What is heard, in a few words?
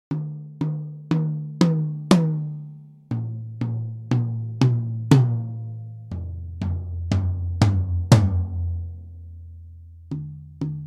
Tom Drums - Hickory Sticks